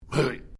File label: Created Creature Small Exclamation, alien, created creatures, vocalisations and pretend speech; Aliens; SoundEffects Foundation2